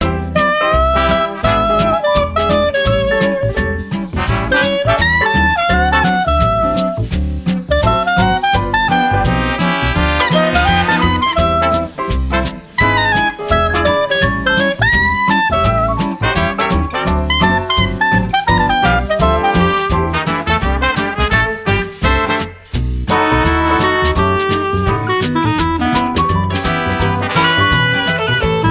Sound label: Diner Dressup; The Princess and the Frog; wii windows; Frog Music